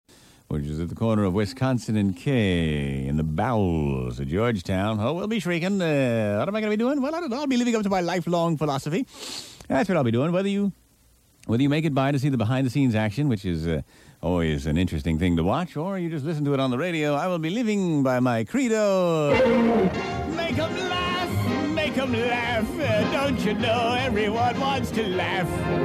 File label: Song; Greaseman